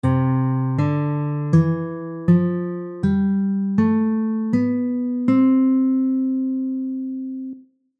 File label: scale, guitar